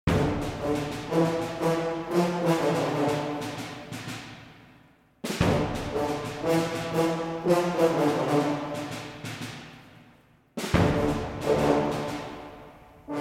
Fly Helicopter Music

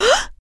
Female Surprised

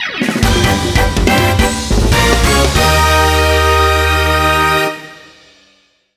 Jingle ~ Mini-Game Clear!; mario super sluggers; wii

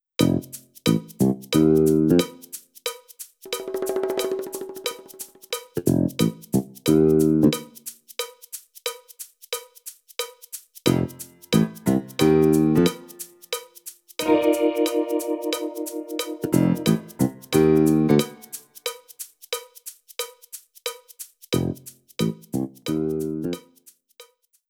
Rocket Belt Intro; Pilotwings Resort

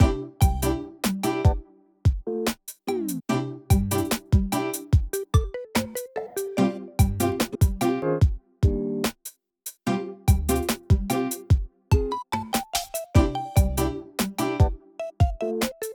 BGM Menu Connect; clubhouse games 51 worldwide classics 2020 switch gamerip

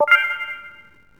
Share Sent; Trainyard Express

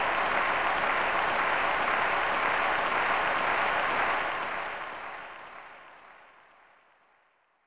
Applause; Jeopardy; snes

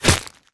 bullet impact ice; Infernal Radiation

